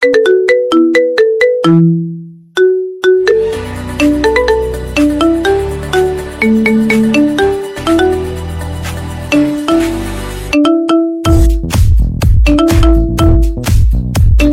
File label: Marimba